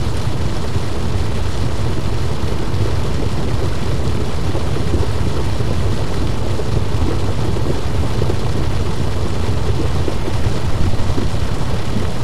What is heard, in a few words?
waterfall